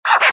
scratch

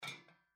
bird; starwars; the force awakens

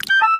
item placed in wrong basket; princess

